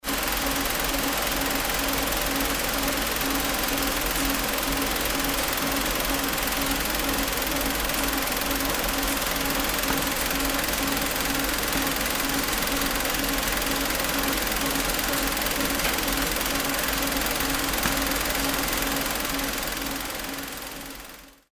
winding machine

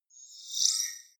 movement creepy robot

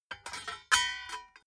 metal plate small handling

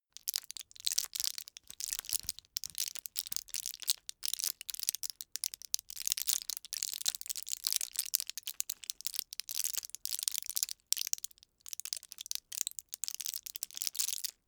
plastic drug blister bend crackle

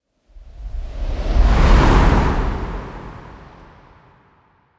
whoosh air

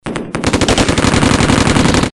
machinegun

